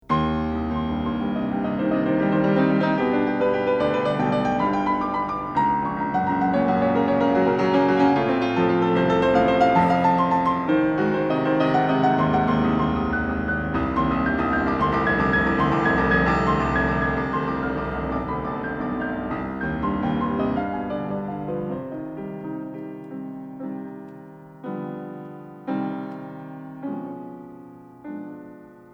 Variations For Piano

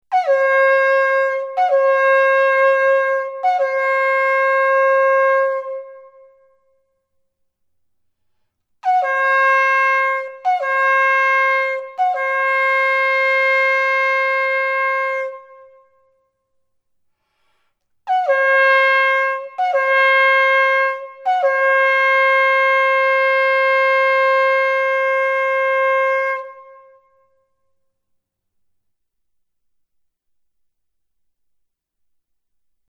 Trumpet Call; Ancient Sumerians-Egyptians-Greeks

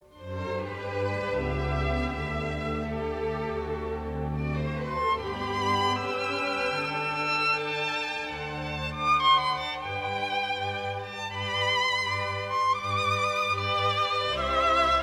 Serenade No. 7 in D Major, K. 250 III. Menuetto; Haffner; Mozart, Wolfgang Amadeus

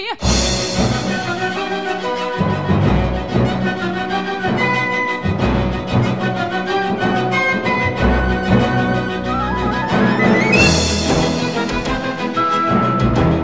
Orchestral Interlude; Aspects Of Love (Original 1989 London Cast); Second Orchestral Interlude

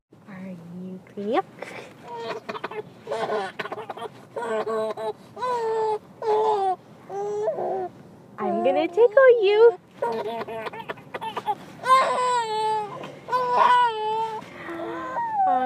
Tickling

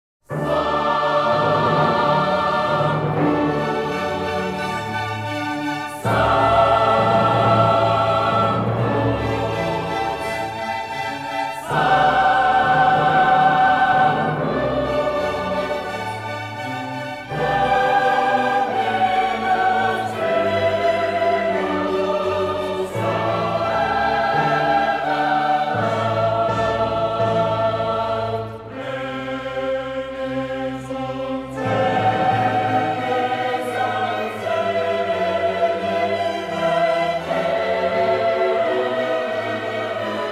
Requiem in D Minor, K 626 XI. Sanctus; Mozart, Wolfgang Amadeus

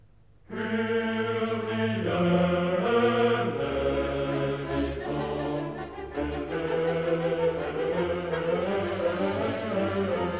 Requiem in D Minor, K 626 II. Kyrie eleison; Mozart, Wolfgang Amadeus

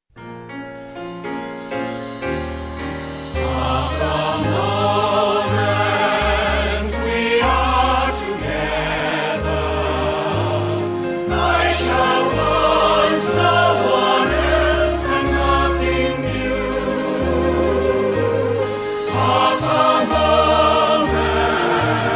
Banjo Mix; By Jeeves (1996 London Revival Cast)

